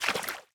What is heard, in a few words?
Footsteps Water; UltimateFishing2020